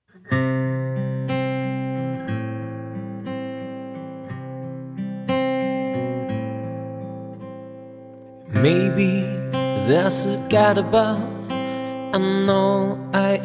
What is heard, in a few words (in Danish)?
sanger med guitar